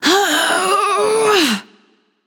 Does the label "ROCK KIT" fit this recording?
No